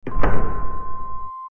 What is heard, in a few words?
door